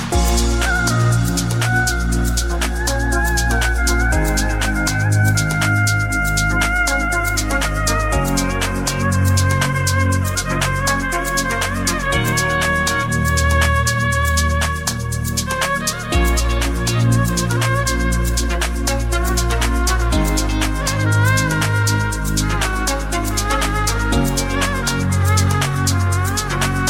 Ringtone; Samsung

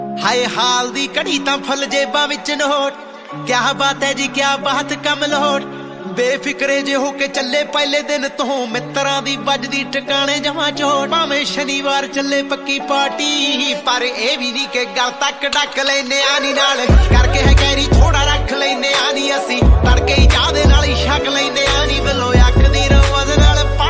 Punjabi

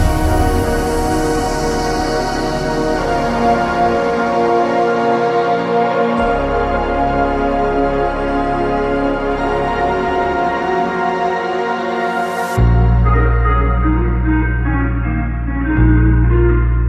Huawei Ringtone

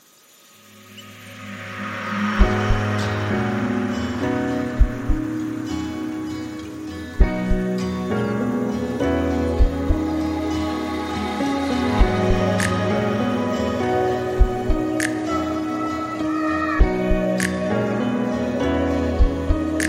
Huawei Ringtone; Honor 7A

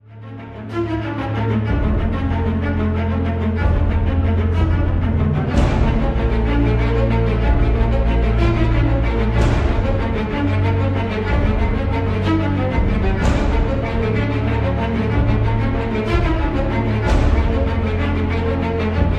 Game Theme Ringtone; Avowed

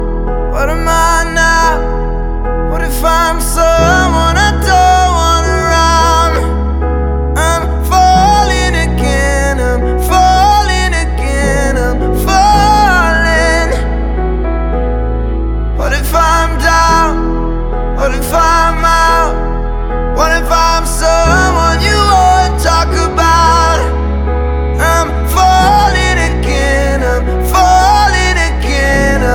English Song Ringtone

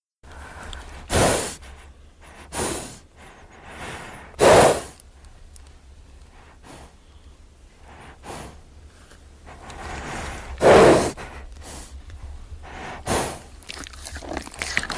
Horse Neigh Ringtone

